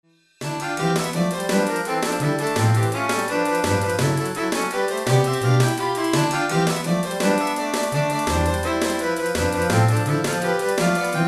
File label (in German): Quintett